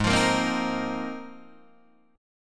G sharp 6th Add 9th, Guitar, Open D

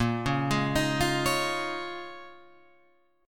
A sharp Minor 11th, Guitar, Open F; 10,8,8,10,8,8; arpeggiated; JGuitar

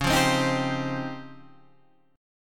Db Major Sharp 11th, Guitar, Drop D; 11,8,10,8,8,8; strummed; chord shape; JGuitar

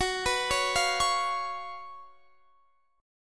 F sharp Major 7th Suspended 4th, Guitar Standard; F#M7sus4; arpeggiated; x,21,21,18,18,21; down; capo=3

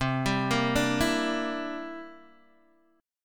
C 9th, Guitar DADGAD, chord

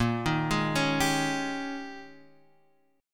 Ab Major 7th Suspended 2nd Suspended 4th

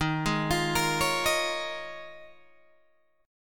Eb 9th Suspended 4th, Guitar, Open G

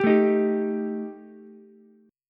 G Suspended 2nd Sharp 5th G Ukulele; Gsus2#5 strummed; JGuitar

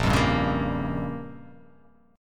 Bb Minor 6th, guitar; strummed; JGuitar

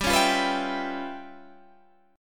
Db 7th Sharp 9th Flat 5th G

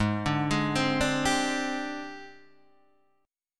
Ab Major 7th Flat 5th Ab, down, Guitar, Standard; arpeggiated; JGuitar

